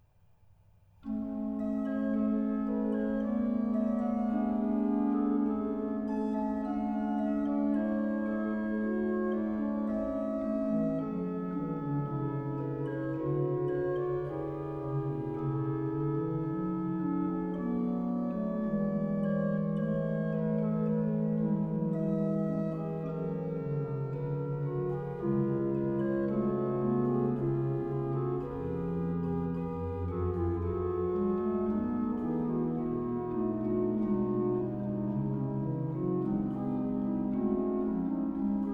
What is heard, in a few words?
Ariadne Musica Praeludium et Fuga XIV, Organ Music from Bohemia; J. C. F. Fischer